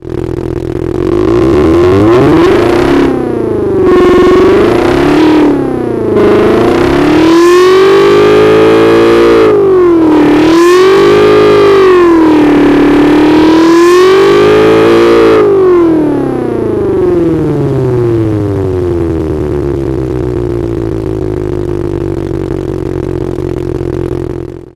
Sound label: race Car